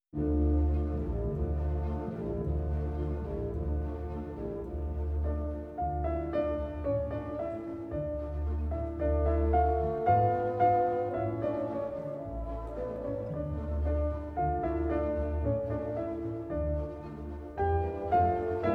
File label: Piano Concerto No. 3 D minor first movement; Rachmaninoff; Legend of Galactic Heroes; Galactic Empire SIDE